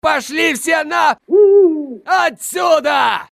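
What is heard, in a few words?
owl is indignant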